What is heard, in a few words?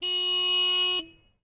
horn motorbike; Blind Drive